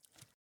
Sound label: water jump